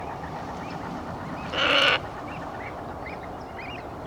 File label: glossy ibis; اکراس سیاه